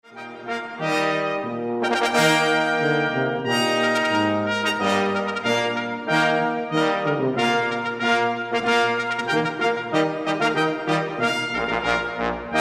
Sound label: olympic fanfare, brass band